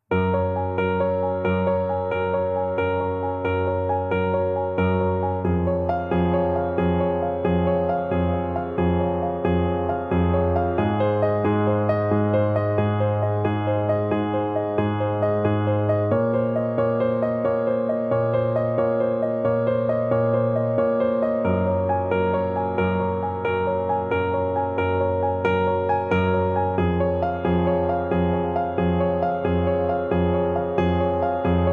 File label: Piano Mix